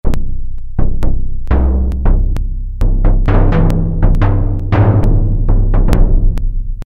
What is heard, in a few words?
Drum Booms, Roland Juno-106; Factory Preset